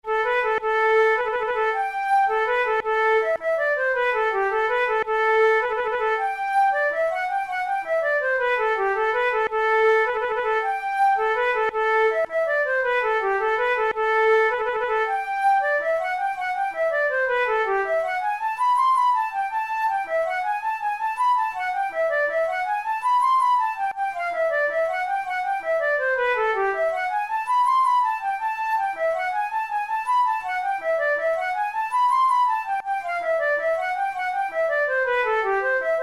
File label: jig, flute; tim hogans